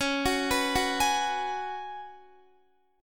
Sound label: C sharp 7th Suspended 4th C sharp, Guitar; C#7sus4; arpeggiated; tuning=4F,4A,5C,5G,5C,6E; guitar chord